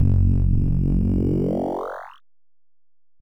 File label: tachyon, shoot; jsr-clone; tefek.srclone